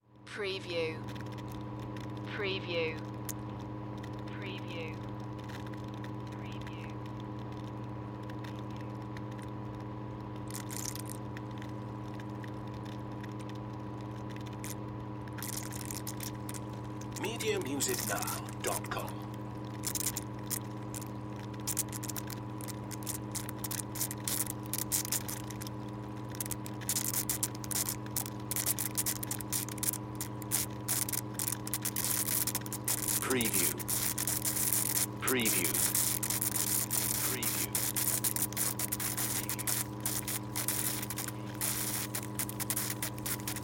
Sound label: Water Pump Run; Sound Effects Bible